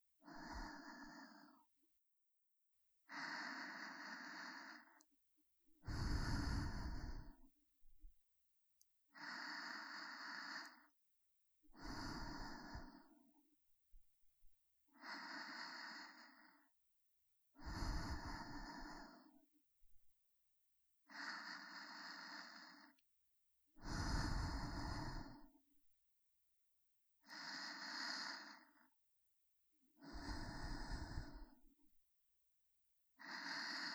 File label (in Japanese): 吐息パート(右耳、左耳)